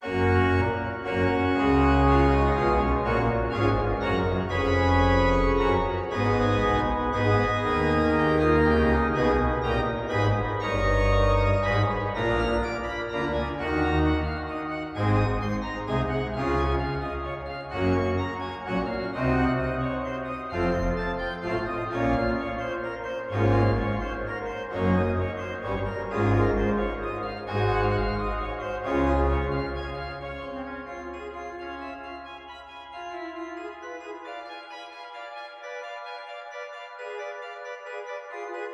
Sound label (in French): grand choeur; e.lemaigre